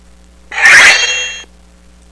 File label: Sword Sound